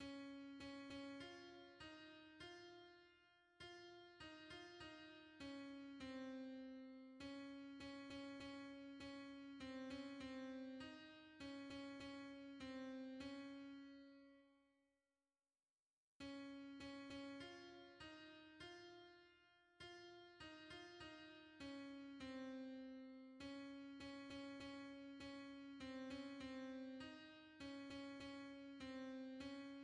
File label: alto; Grace le plus doux son; hymns